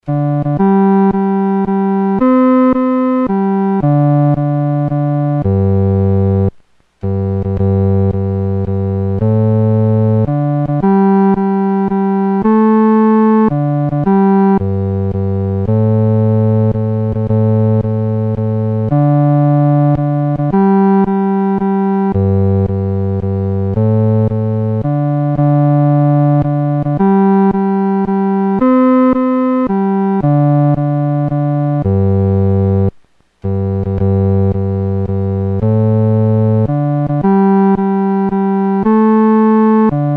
独奏 第四声; There’s a Song in the Air; Josiah G. Holland, 1819-1881

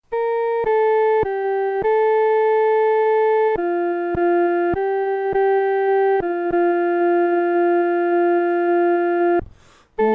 独唱 第一声

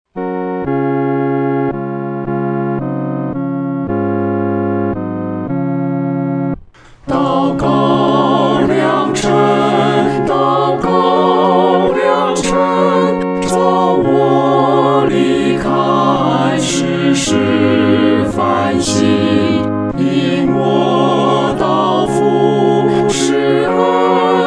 合唱 四声部

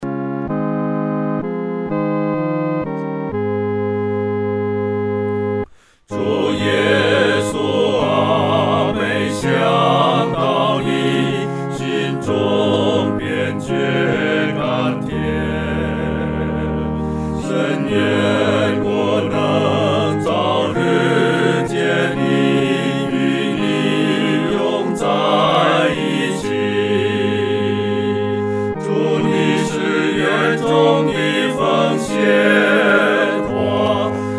合唱 四声部

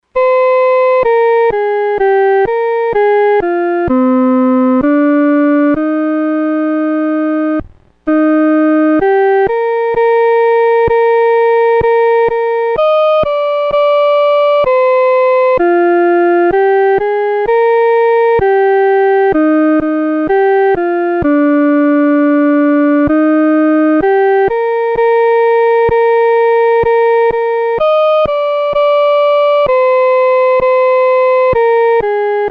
独奏（第一声）

